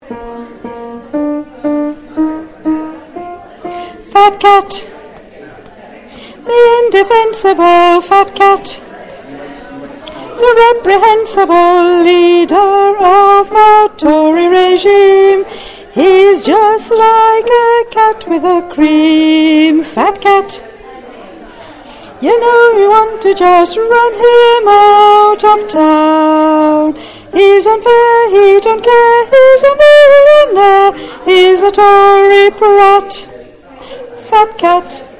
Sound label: Alto